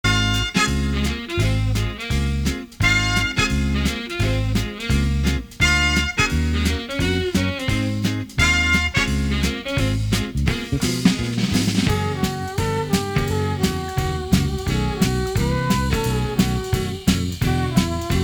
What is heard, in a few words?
Big Band Medley; variety band